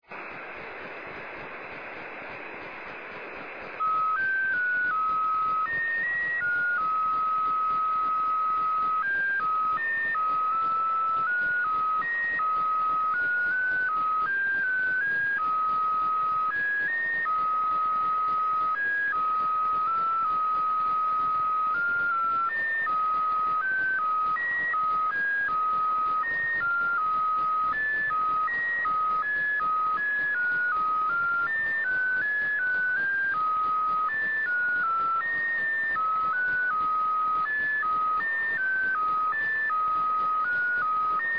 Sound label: beacon jt65c